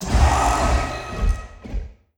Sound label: army construction done; Stellaris